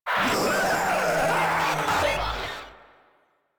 Proto Infested Runner Vocal Attack; Warframe

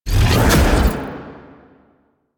Sphere Cast; DE; Warframe; Warframes; Gyre

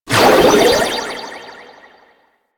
Aqueous Discs Cast; Warframe; Yareli

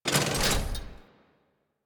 Mech Step Arm Down; Nechro Mech; Vehicles

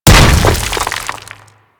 Hammer Impact Dirt B

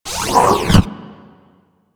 Door Close; Clan Dojo

